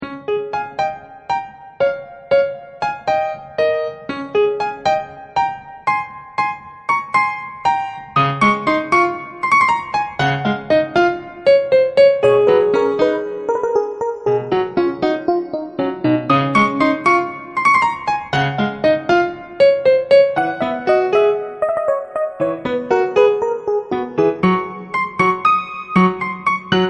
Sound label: bgm novel middle tempo; Goodroid Inc; Android